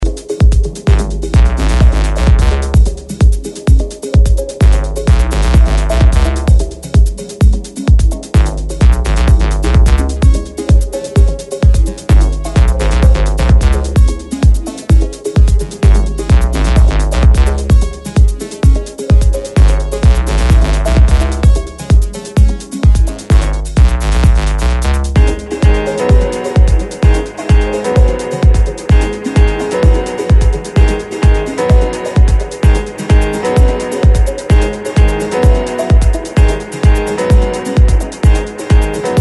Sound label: (Acid Bass Instrumental); Tresor